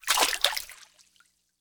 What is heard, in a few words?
Water Walk; moul